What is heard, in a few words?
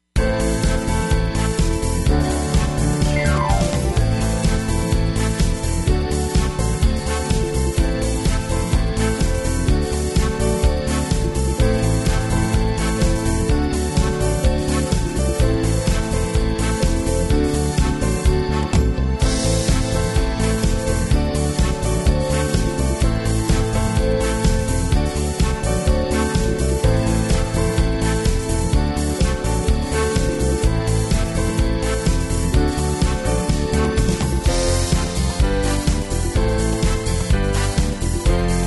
everybody disco down rhythm track